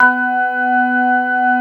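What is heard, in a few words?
CX3 organ B3 L, Keyboards Old School; USB Soundscan vol.09 - Keyboards Old School; AKAI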